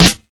00s crunchy snare f key, steel snare drum tuned to the F note; snare drum samples